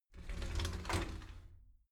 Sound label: shower slide door inside open soft halfway